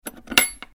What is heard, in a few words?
Drawer Latch